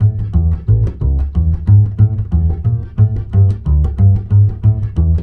BASS, Balkanish Traditions

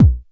K909, KICKS TR 9+8

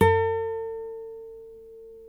NYL NV B, nylon no vib guitars; Spectrasonics; Hans Zimmer Guitars VOL-1

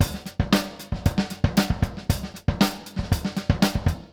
Beat Loops, 116; Zero-G - Monster Beats

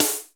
TRASH SNARE, DANCE; Hollywood Edge; The Big Bad Beatmachine

